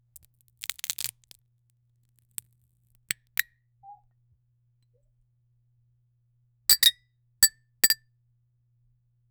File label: realistic sound of a pers, aluminum foil